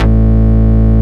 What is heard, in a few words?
Bass Root A